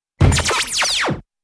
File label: Drum Hit W synthy Bee, zaps